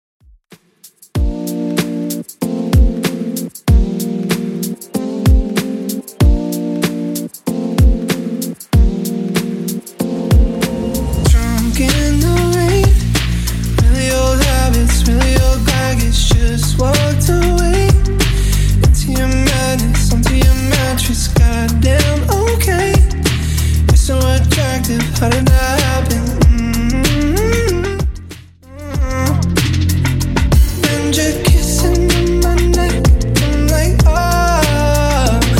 Top40, 95bpm; Redrum

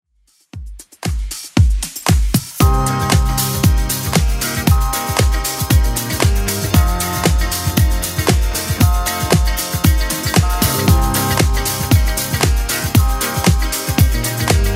70s Club Edit 116bpm

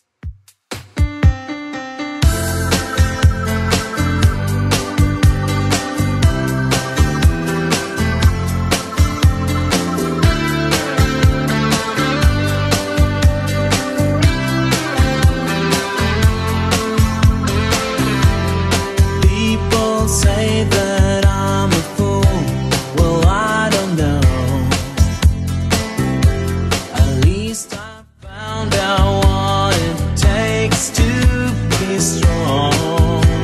80s Redrum 120bpm

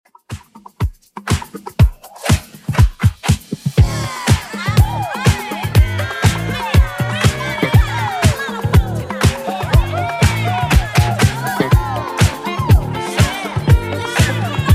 80s Redrum 121bpm

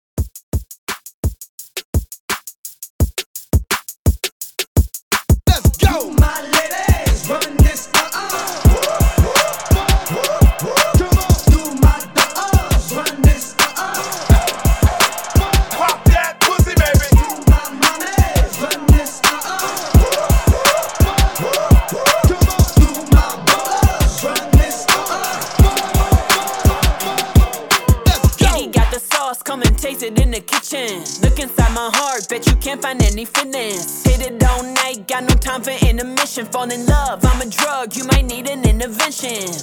85 BPM

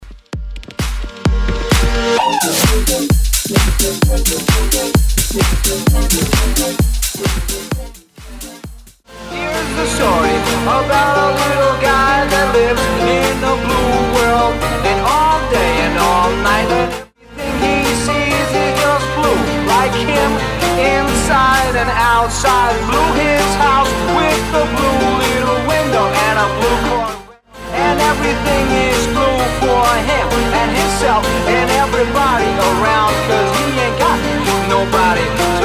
Bigroom Banger 130bpm

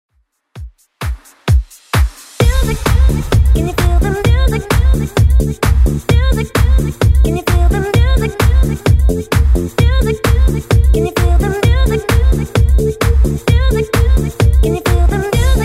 90s, 130bpm; Redrum

